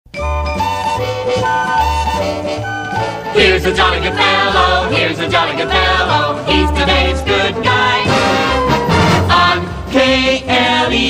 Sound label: He's An All-American; KLEO